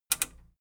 metal button radio click enable fast

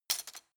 cutlery plastic drawer place

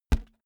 balloon hit hand

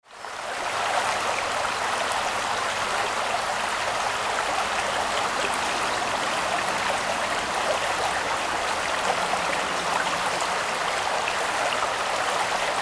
stream medium

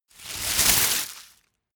tear

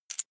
coin big drop soft

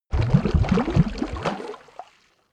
volcano oneshot lava bubble

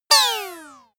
spin down synthetic

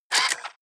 turn on off

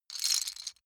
lego bricks handling single

